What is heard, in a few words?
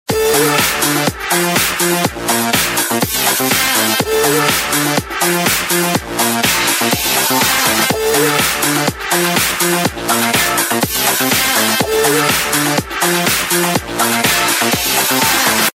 Ringtone, Samsung GT-S3310